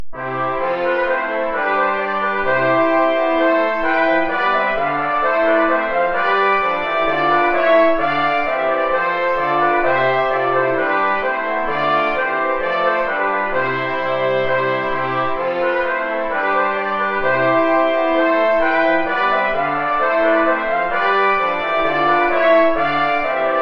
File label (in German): Ballet des feus (2 Trompeten in C & 2 Posaunen)